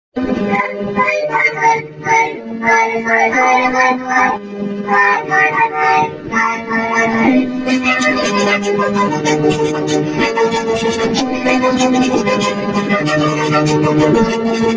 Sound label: song autotune; fnaf; Voicemod